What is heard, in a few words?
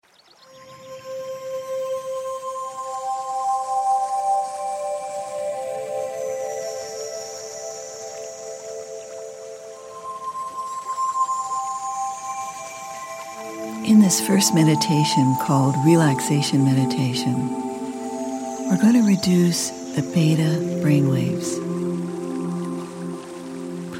relaxation meditation beta